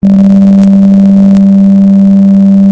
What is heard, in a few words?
sine wav deformed